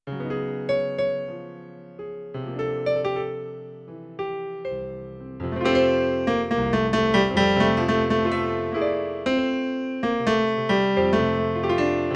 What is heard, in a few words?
Tenor melody; RIVERSIDE THEATRE